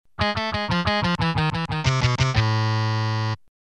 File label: lick